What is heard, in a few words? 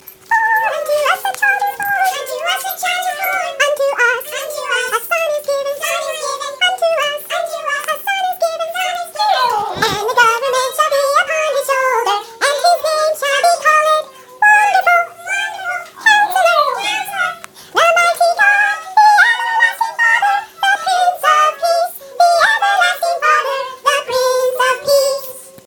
chipmunks; isaiah 9 6